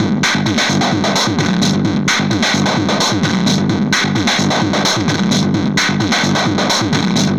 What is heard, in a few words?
Processed Beat, Drums & Percussion